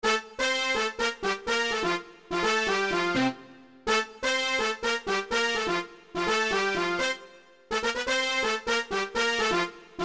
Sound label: Brass; Arlequin